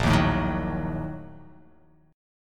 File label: A sharp Major 7th Suspended 4th Sharp 5th